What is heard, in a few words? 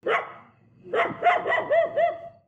dog; Mount&Blade Warband